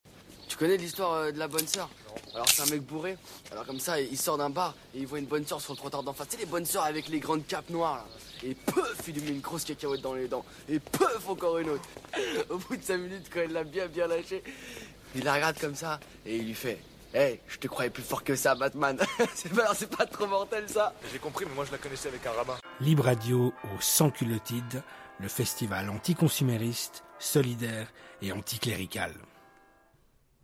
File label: Jingle